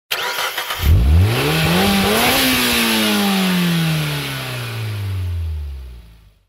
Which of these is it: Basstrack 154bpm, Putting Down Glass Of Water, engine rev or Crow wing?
engine rev